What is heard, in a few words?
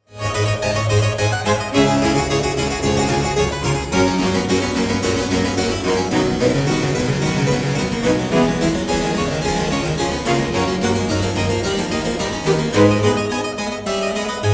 concerto for 4 harpsichords strings and continuo; bwv 1065